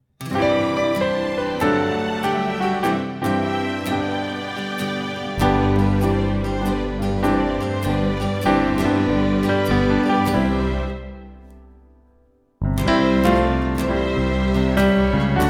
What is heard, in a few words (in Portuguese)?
É Hora De Escutar (Backing); jardim infancia